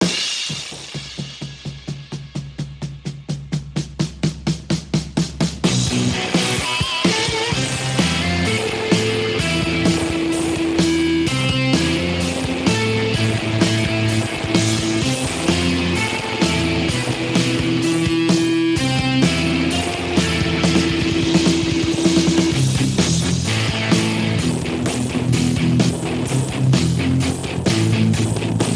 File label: generic rock; speex 32vbr wide 10cmplx